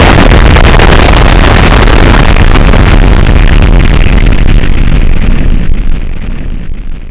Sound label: bomb explode